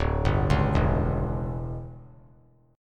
D Major 7th Suspended 2nd Suspended 4th D down 3C 3F 3A 3D, chord; DM7sus2sus4; arpeggiated; JGuitar